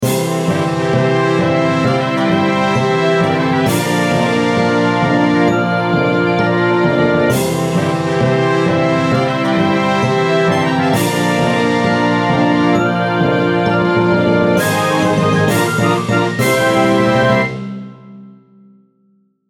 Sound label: fanfare